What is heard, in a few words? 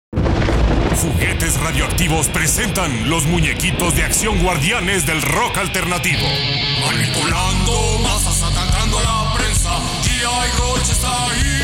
Grunge; 1996 Radioactivo; G.I. Grunge